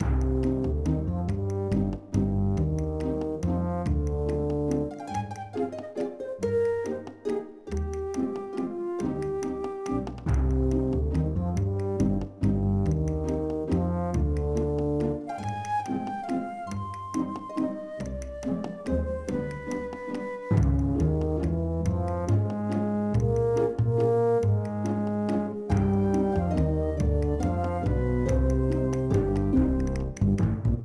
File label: Music Loop; Charlotte's Web Wilbur and Friends